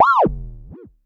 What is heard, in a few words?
vermona drm1 mk3 fx